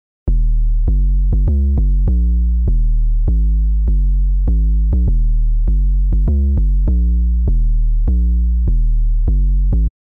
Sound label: bypass bass; shaperbox